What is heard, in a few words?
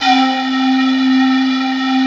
FLUTE L, Drum & Bass 160 BPM; Ueberschall; Drum & Bass Flash Trackz